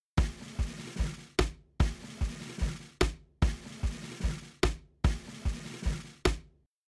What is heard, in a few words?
Drums Roll, shuffle drums; WDR2